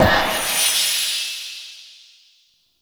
Spawn Machine Item Spawn; Echoes; Warframe; Entrati

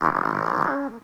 Kitten Snore; Enemies; Warframe; Catbrow

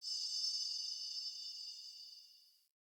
Alley Distant Chains

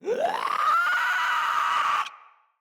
Infested Runner Chasing

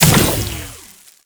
Tentacle Proj Die; Warframe; Proto Infested; Ancient; Abilities

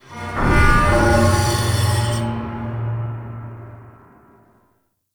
Metal Scrapes And Hits, Asteroid; Warframe; Grineer

